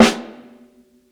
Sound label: 60s SNARE MED; Basement drums vol 2